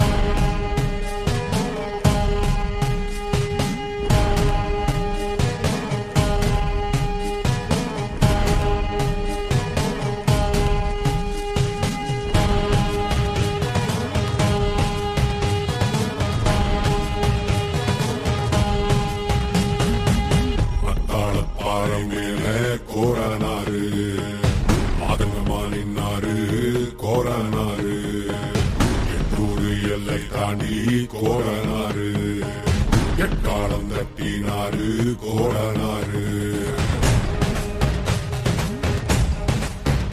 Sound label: Ringtone